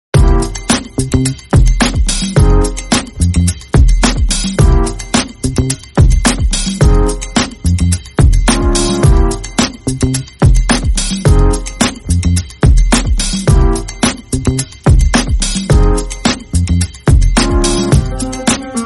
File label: instrumental